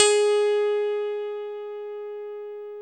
KEY MKS P3, MKS20 P3+EP1; Roland L-CD701; Pop Pianos 2

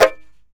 TAR; Roland - LA Composer vol. 3

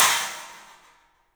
CYMBALS; 3D Sound; 3D Drums

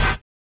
collision; Spectrasonics; Metamorphosis

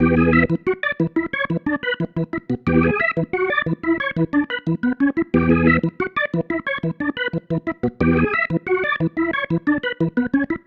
Pattern, Organ

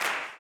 CLAP, Blues Soul; Spectrasonics Vocal Planet

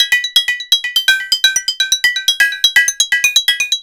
synth arp; Electrocution

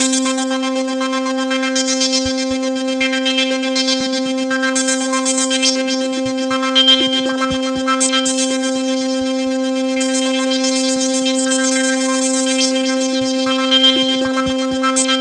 Roland JV 2080, poly saw

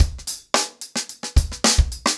110BPM, acoustic drums; The Roots Drumloops

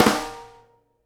snare GLASS, Live Snares

